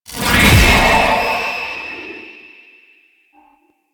Wraith Possess; Enemies; Warframe; Zariman

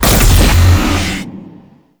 Race Gate Hit; Warframe; Tau; Circles Of Hell

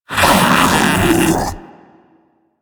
Infested Splitter Joined Vocal Alerted; Warframe; Grey Strain

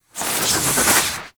Melee Heavy Attack Small Charge; Warframe; Weapons; Whooshes

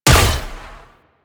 Gas Arrow Explosion Hit, Weapons; Warframe; Infested; Derelict Cernos Prime

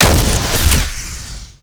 Flak Cannon Alt Fire, weapons; Warframe; UT Flak Cannon

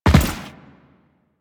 Land Default, Footsteps; Enemies; Warframe; Teshin

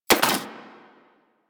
Rifle Mech Alt; Weapons; Warframe; Thanotech

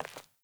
Sandals Walk Default, Footsteps; Characters; Player

